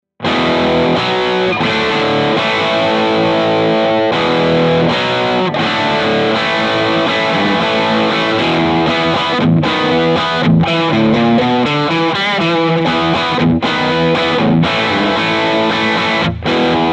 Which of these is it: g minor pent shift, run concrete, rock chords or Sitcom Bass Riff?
rock chords